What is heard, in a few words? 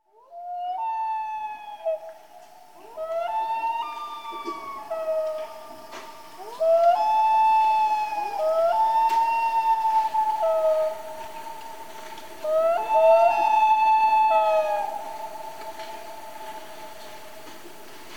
Canadian Loons In Family